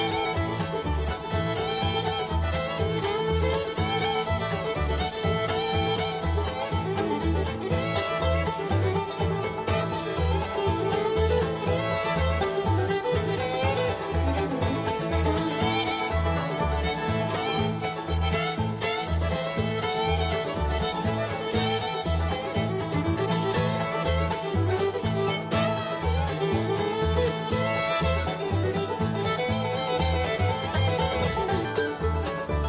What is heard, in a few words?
Cluck Old Hen Fiddle Mando; mandolin